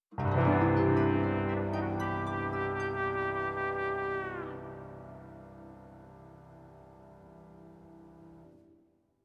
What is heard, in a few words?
Sting; lego the incredibles 2018 switch; Mx Hub New Area Reveal